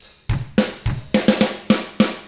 105 POP RK, Drums; ILIO; Double Platinum Drums 1

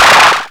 Percussive, Drums Perc One Shots

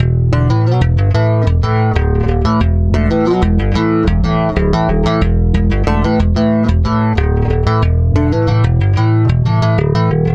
Stick Loop, Stick Bass

